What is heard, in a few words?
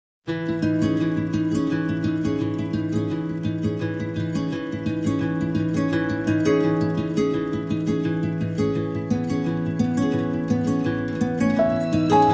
No Lead Guitar